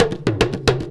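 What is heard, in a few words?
big tama groove; Best Service; World Colours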